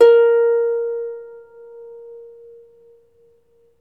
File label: Troubador Harp